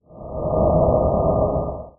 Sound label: Transition hum